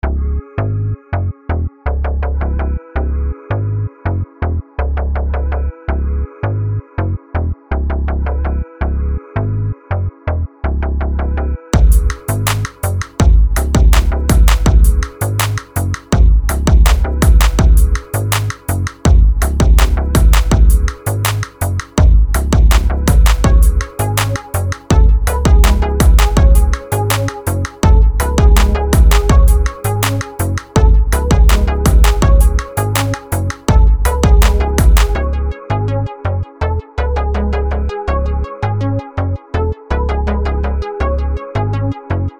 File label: Dark Melody